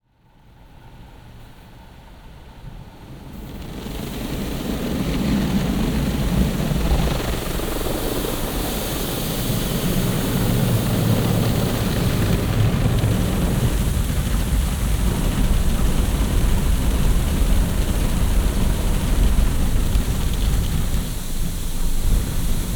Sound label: Some Noises from Carwash